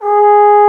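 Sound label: Trombone mf G#2; Digital Sound Factory Virtuoso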